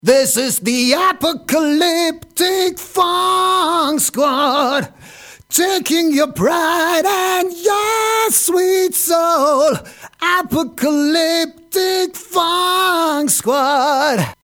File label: Vocals Male Minimum Gain